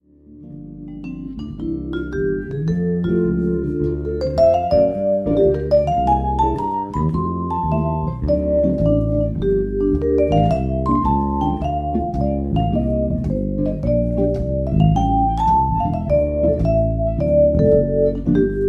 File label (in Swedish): Solo